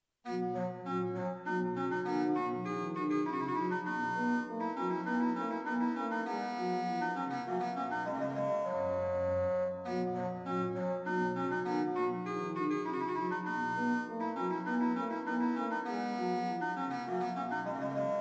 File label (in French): orgue; Reducciones